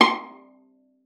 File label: VIOLA FINGER; Xsample VOL-5